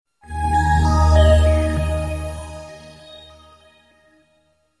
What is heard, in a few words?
Cool Sms Tone